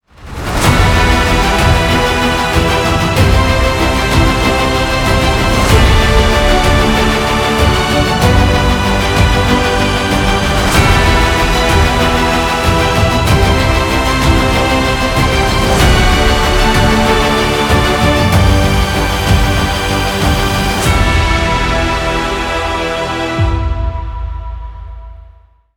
epic orchestral awards opening

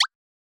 game balloon or bubble pop

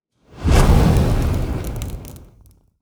fire swoosh burning

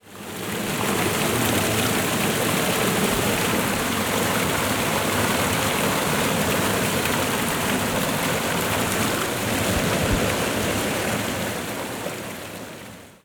waterfall ambience

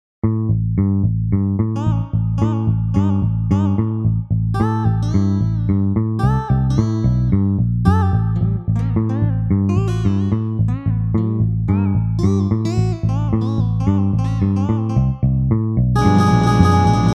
guitar interruptions

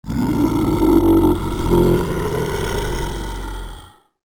scary monster growl roar

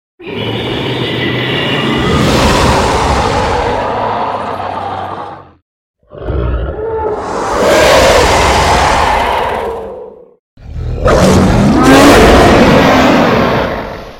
giant monster various roars

